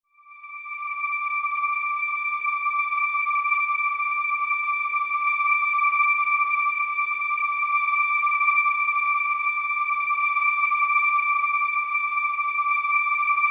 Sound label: Mystery Layer